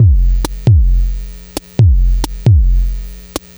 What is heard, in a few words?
Miami Bass Fuzz, Modular Electronica; Beats; Sonic Foundry (Sony Creative Software)